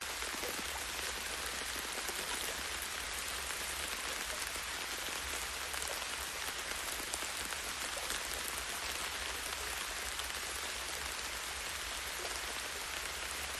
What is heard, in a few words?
rain intense foliage drippy; PMSFX